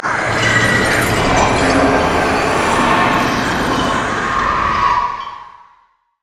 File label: wall; LoL; thresh